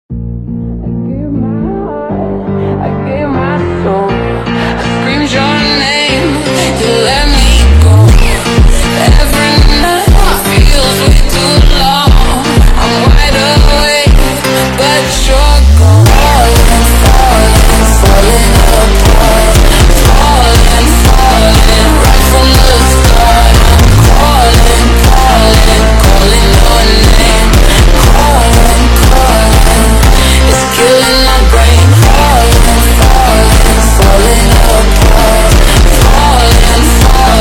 funk slowed reverb

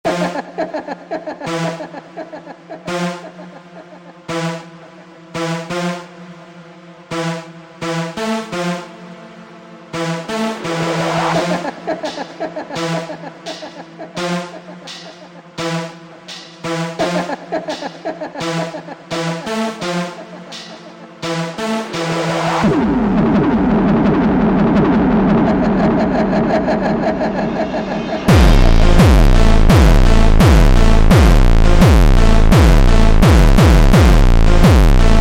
jumpstyle super slowed

